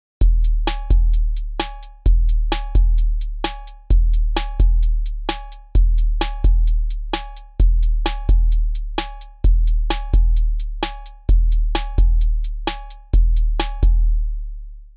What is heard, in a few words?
Drummachine